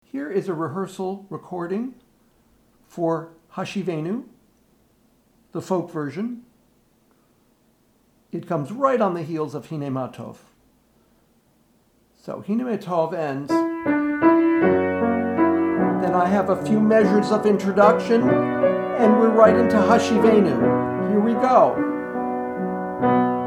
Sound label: folk